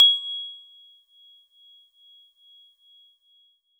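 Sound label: Celeste DX100 G#1; Pristine Celeste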